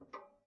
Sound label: Ping, Kitchen Toaster